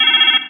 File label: buzzin; There; ThereClient